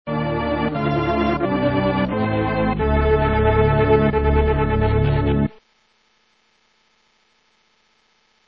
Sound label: load music